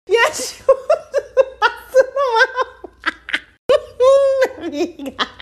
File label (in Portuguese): risada